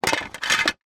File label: game plastic rack put into box; scrabble